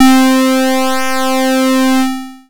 PWM effect

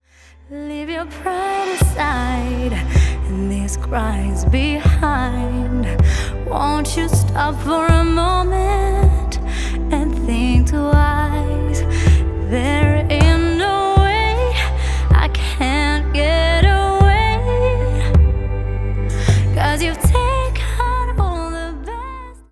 Music mono

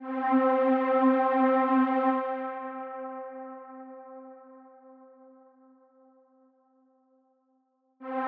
Synth sound

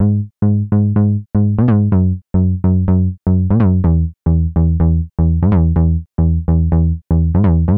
Bass line with Glide

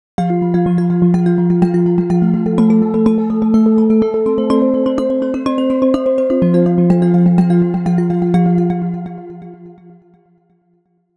Sound with Arpeggiator; Omnisphere

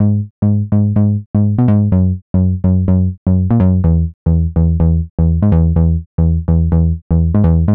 Bass line Glide 0

